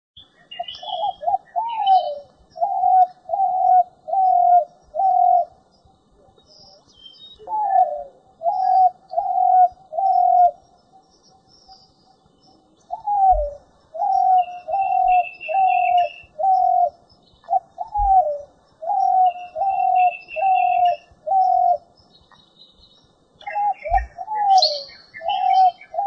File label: spotted dove